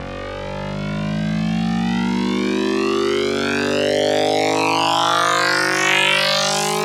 Riser Turbo; Riddim; One-Shots; SFX; Ghosthack; Riddim Essentials Volume 2

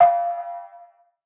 Vintage Percussion; Phonk; Cymatics; Mystery Pack Vol 4

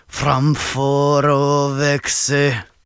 Battle Cry, Viking Vocal, Un-Processed

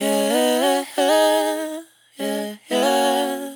Ad Lib Clouds - 135 BPM G# Min Dry, Vocals; Cymatics; ZODIAC Beta Pack

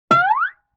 Guitar Plink Slide

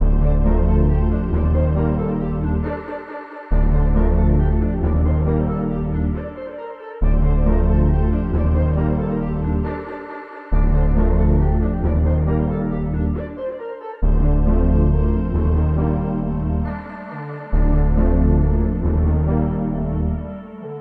137 BPM D Min, Synth; Melody Loops; Synth Collection; Cymatics; ANALOG EVOLUTION Beta

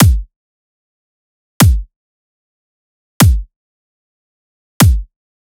Dubstep Drum Loop Kick, 150bpm; Full Drum Loops (Stem Seperated); Ghosthack; Dubstep Sound Arsenal

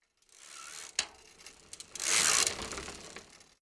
Bike By; Fairlight Sound Lib

